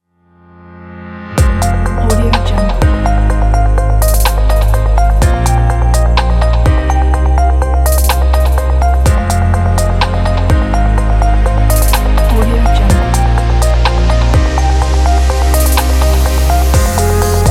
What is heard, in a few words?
digital technology kit